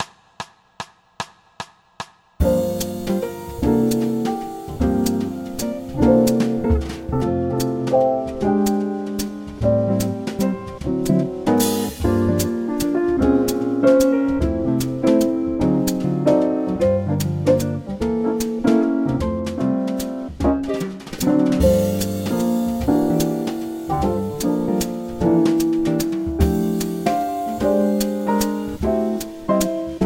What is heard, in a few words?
bass play along, waltz, jazz